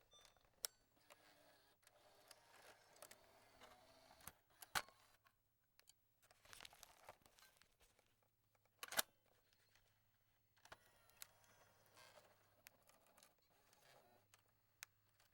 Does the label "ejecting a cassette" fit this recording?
Yes